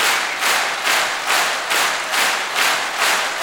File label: Crowd Effects